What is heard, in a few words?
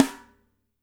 PIC SN, DRY KIT; Spectrasonics - Burning Grooves CD2